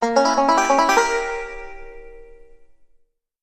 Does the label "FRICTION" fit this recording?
No